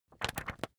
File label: magazine skim